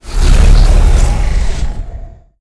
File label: sfx cruiser moving out; Stellaris; nemesis